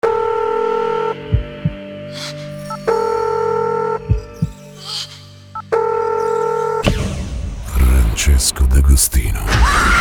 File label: Scream